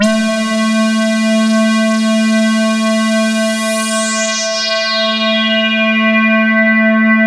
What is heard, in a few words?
LUCKY SWP, SYNTH; Keith Emerson Signature Series